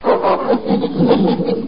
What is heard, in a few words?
primate, ambient